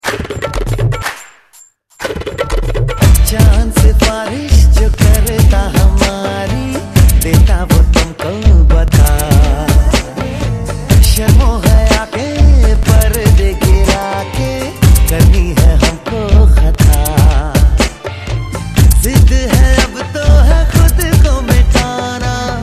bassmix, ringtone; fanna

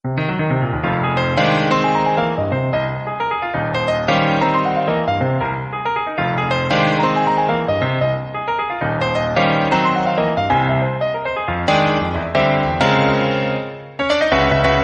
theme, ringtone

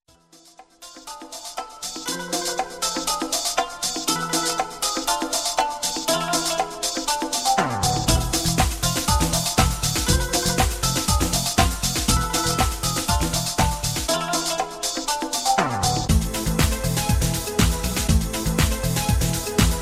arabic music

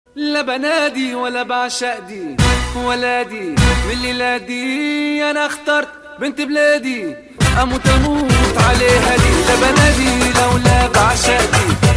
arabic tune

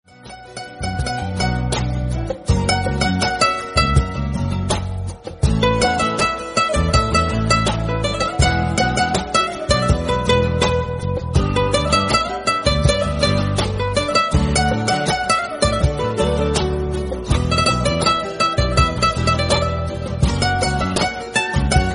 ringtone

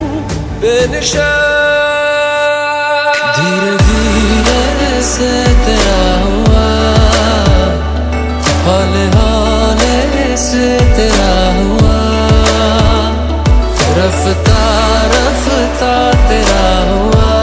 bollywood

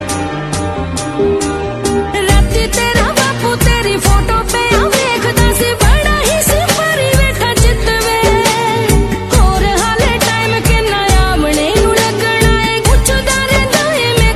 bahrain punjabi song best ringtone

